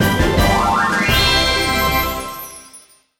Treasure Succes

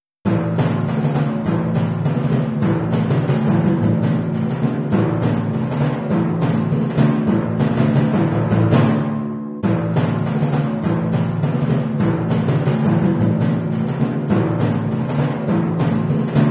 Goals Complete; Buildalot

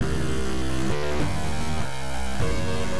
Effects Trash

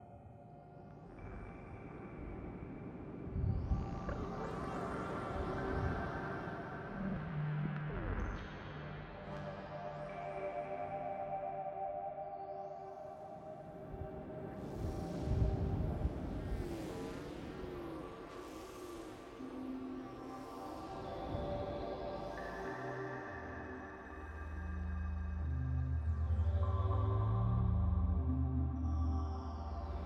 music amb - Ambience; Stardrone; PS3

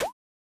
Character Shoot; starbites

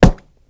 Arrowstorm; Rabbids Multiverse

